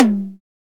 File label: high tome drum sample g key, tom tuned to the G note. Loudest frequency: 808Hz; tom drum samples